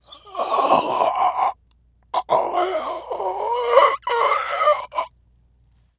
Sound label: amb zombie; cstrike